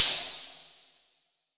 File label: Hat, Dubstep One-Shot Drums